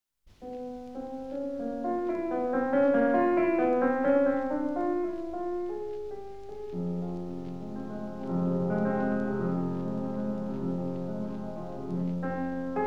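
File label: Nouvelle Etude in F minor, piano; CHOPIN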